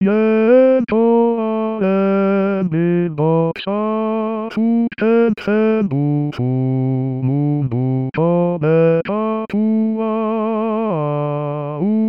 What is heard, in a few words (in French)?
Basse